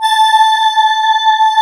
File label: CMI ORGAN